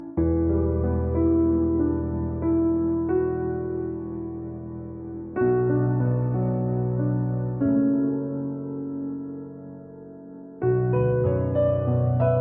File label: piano